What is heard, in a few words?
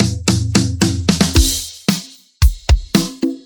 DRUMS